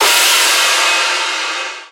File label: HiHat, Percussion; Sample Banks